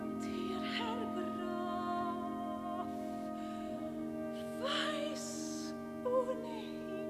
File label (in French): souffle